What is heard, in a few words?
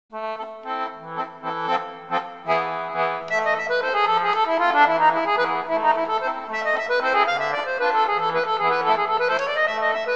concertina; played rather badly!; Sweet and Sour Sock; English concertina